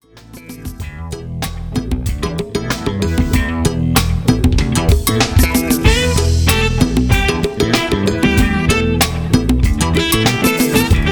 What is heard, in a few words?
Funk